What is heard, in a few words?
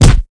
punch; SINDEMO; impact